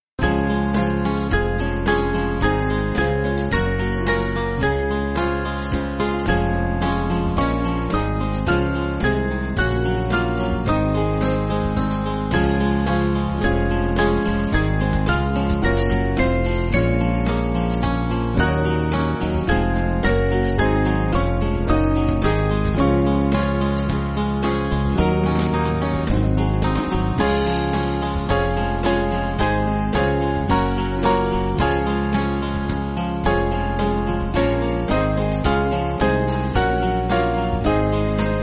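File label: Band, Music Backing Files for Churches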